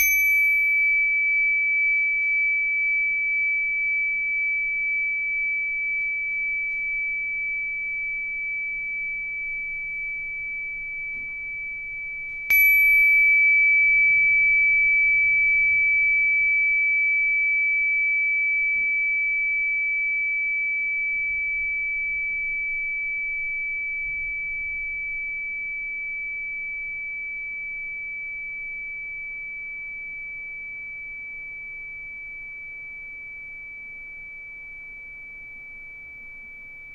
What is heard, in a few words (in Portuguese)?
Pin Re, instrumentos de quartzo; escutar instrumentos de quartzo